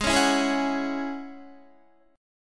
Ab 7th Suspended 4th Sharp 5th Ab down Guitar, Chord; Ab7sus4#5 strummed